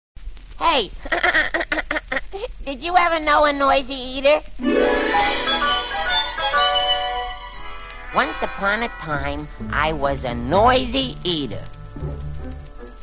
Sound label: noisy eater; Kiddie Rekord Music Library